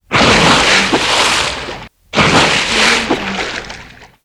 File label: splash